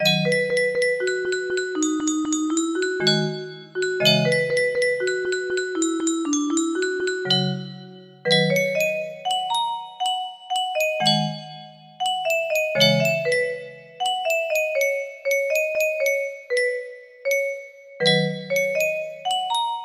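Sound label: music box melody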